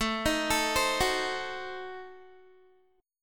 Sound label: A Diminished 7th A down Guitar; arpeggiated; tuning=4E,4A,5D,5G,5C,6F